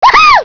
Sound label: giggle; quake2